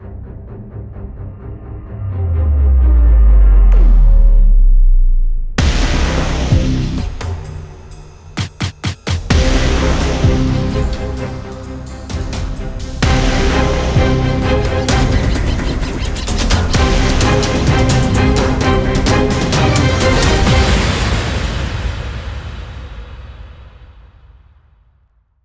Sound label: Intro Cinematic Action Ident